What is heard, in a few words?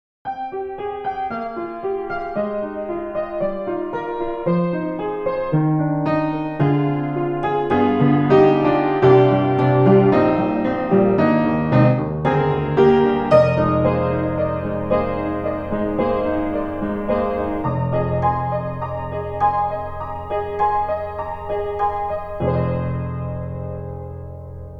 Saloon Piano More D-Tuned; VI ONE